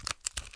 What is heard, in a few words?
Tree Gather